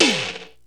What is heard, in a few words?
Normal Hit; Skype